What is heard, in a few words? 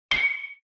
Baseball Metal Bat; SNES